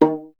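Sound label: STR VLA D, strings viola; Miroslav Vitous; Solo Instruments VOL-1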